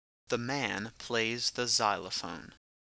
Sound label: xylophone